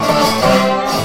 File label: dobro danger